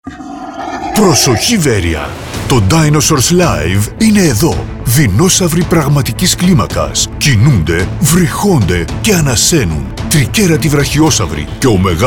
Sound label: Dinosaur Live; Veroia; i deinosavroi se pragmatikes diastaseis sti veroia